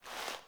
Operator Foley Lift Head; Warframe; The War Within; React Wall Pulse; Cinematics

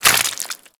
Bleeding; Mods; Proc

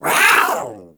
Catbrow Feral Vocal Attack Short, Enemies; Warframe

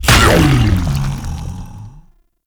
Consume Impact A; Warframe; Grendel

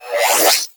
Miter Alt Shot Stop; Tenno

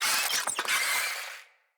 Infested Ashen Vocal Attack Death; Enemies

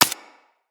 Laser Impact Generic

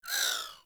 Energy Rifle Projectile; Warframe

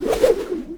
Grapple Update Layer Cast; Valkyr Rework; Warframe; Berserker

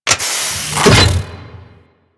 Comet Shredder Iris Door Close; Warframe; Corpus; Railjack

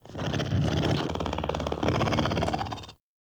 Pet Purr; Warframe; Catbrow; PetShip

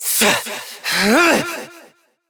Evil Twin Doppelganger Frustration; Battle Barks; Warframe; EntratiDoppelganger

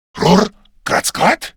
Surprised Grineer Male; Warframe; Battle Barks

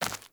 Grunt Step Default, footsteps

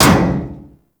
Ship Hit; RailJack Hit Effects

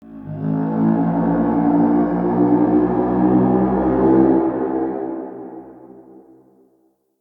Village Day Sky People Horn

